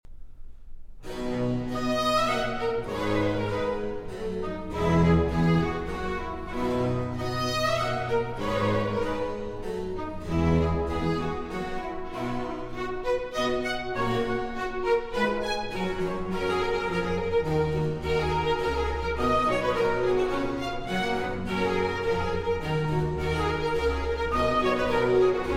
Sinfonia Melodica in C Major, Chaconnette

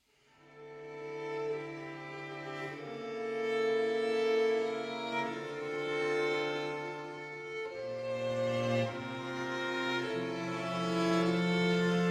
La Suave Melodia (Arr. For Chamber Ensemble); Falconieri; Arr. Tommaso

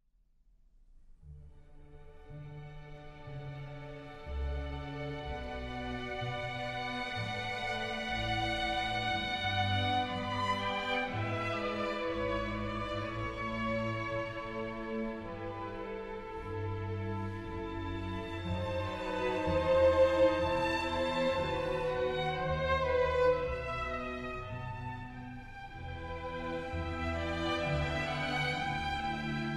Air on a G string; Bach

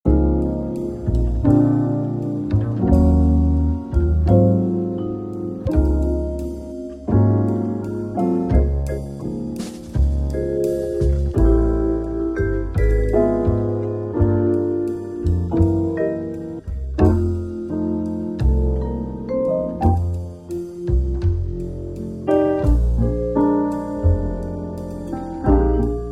Jazz Instrumental

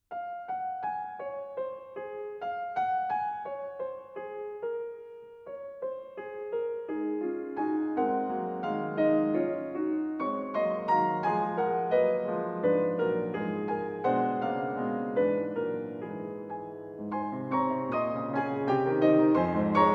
Piano Preludes No. 2 in D-Flat Major, Moderato

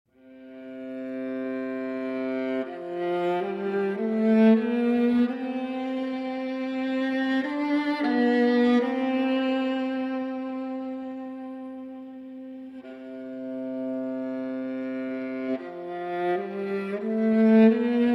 Sonata For Viola Solo, Hora Lunga

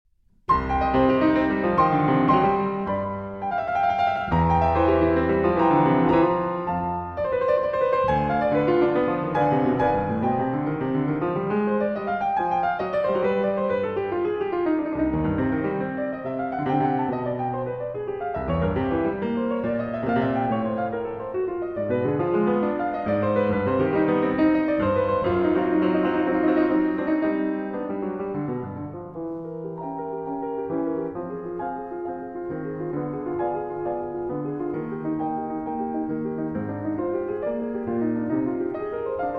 Fantaisie in C Minor

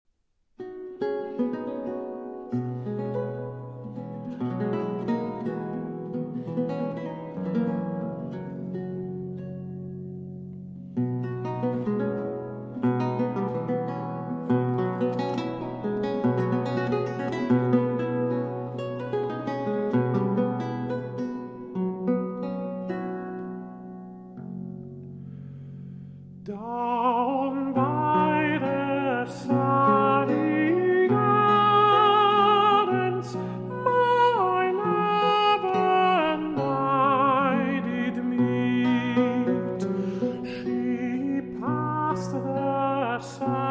English Folk Songs; Down by the Salley Gardens (Arr. Leo Brouwer)

